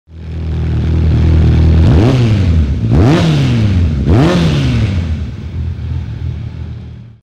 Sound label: bandit 600, Suzuki; Imola